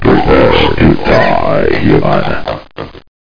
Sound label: PIG WRN; Duke Nukem 3D Kill-A-Ton Collection